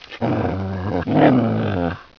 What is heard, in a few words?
dog; MacOS 8.1; Web Page Construction Kit